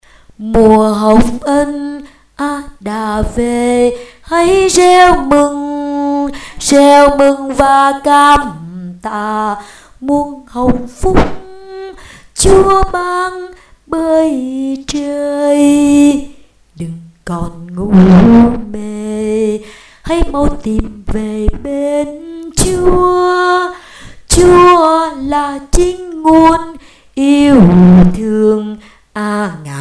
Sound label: basso